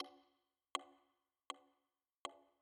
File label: drum